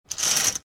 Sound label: Sheer curtain slide soft swish; blinds